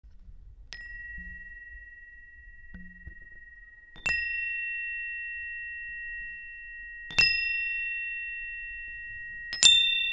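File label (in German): xylophon 3 töne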